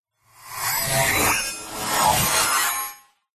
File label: science fiction robot manic glitch psycho